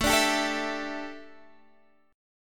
A 7th Suspended 4th, A, down, Guitar, Standard; A7sus4; strummed; JGuitar

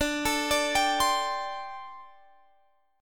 G Suspended 2nd Suspended 4th

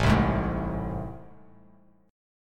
A Minor Major 7th A down, Bass; strummed; AmM7; Bass Standard; JGuitar

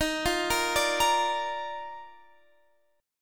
Eb Major 7th Suspended 2nd; EbM7sus2; arpeggiated

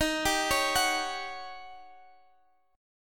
Eb 7th Sharp 9th Eb

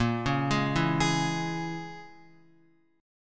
Bb Minor 7th Flat 5th, Guitar; x,1,1,1,4,4; Bbm7b5 arpeggiated; JGuitar

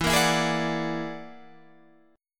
E 9th Flat 5th E down

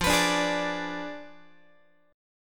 F Diminished 7th F down Guitar; x,13,14,12,12,13; Fdim7 strummed; JGuitar

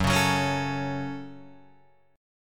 F sharp Minor F sharp, Guitar Open D

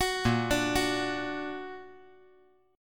Bb Augmented Bb; 11,10,10,9

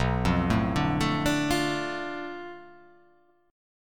B Minor 7th Flat 5th B, chord; Bm7b5; arpeggiated; x,2,3,2,2,4,4,2; JGuitar

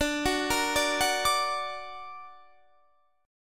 D Augmented D down Guitar Standard; D+ arpeggiated; JGuitar

